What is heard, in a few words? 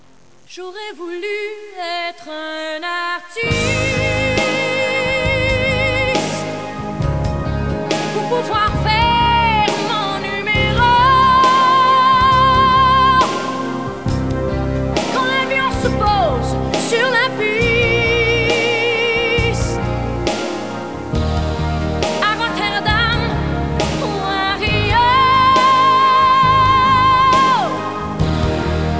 blues